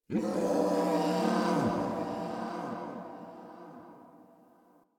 Chatter Distant Growl Moan; Ambience; Misc Outdoor; Warframe; Infested Eris

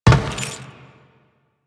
Step Metal, Footsteps; Warframe; Teshin

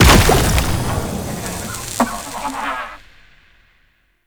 Shroom Proj Explode; Warframe; Nokko; Gyromitra

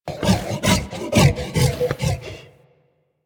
Juggernaut Truffle Found, Infested; Warframe; Truffle Hunt

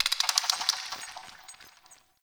Mid Bird

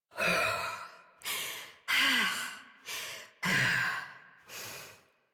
Breath Heavy Slow Operator Voice; New War

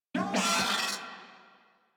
Moa Vocal Pain, Enemies; Warframe

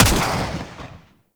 Swarm Missile Shot; Warframe; Eidolon; EidolonJetpackMarine

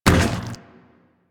Zombie Step Metal, Footsteps, Walk; Warframe; Infested

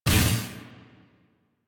Guardian Shell Impact; Warframe; Focus; Vazarin

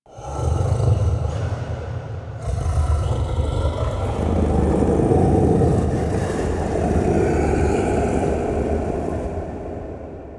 Brain Grunt One Off; Warframe; Infested Eris; Inf Flesh Scape

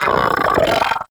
Arachnoid Vocal Attack; Warframe; Corpus; Arachnoid Hunger

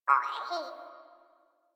flying robot voice; Warframe; Quests; Veilbreaker; Ambience; Kahl Quest

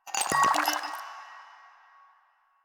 Vial Foley J; Warframe; Lasrian; Lasrian Soldiers; Footsteps; Lasrian Goonadier

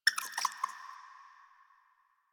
Rat Pet Squeak

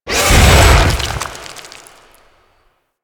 Death Vocal; Ambience; Golden Maw; Warframe; The War Within

